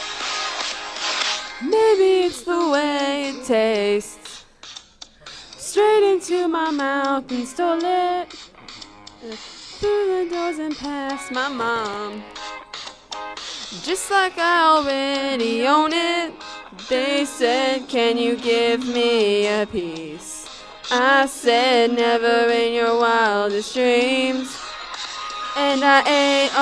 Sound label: commercial jingle